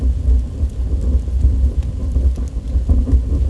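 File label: torch burning loop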